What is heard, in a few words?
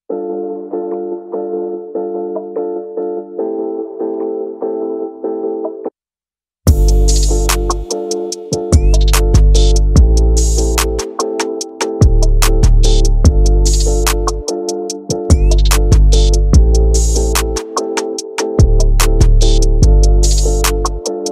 Instrumental Ringtone